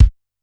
A1 - Bass Drum H, Yamaha PSR-E323 Electronic Kit

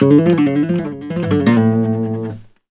Flamenco, Guitar, a

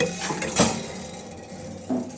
Prepared Piano; Battery 3 Sample Kits

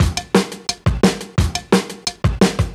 DNB BREAK

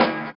SWUSH; Spectrasonics; Metamorphosis

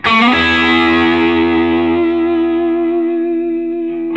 SOLO E, Vintage Blues Guitar

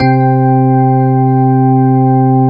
HARMONIC ORG, FUNKY ORGANS; Zero-G; Funky Elements

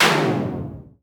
tom, drum; Masterbits - Total Zone

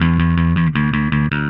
Happy Pub Bass; Sonic Foundry (Sony Creative Software); Indie Rock Anthems; Session 05 - Happy Pub

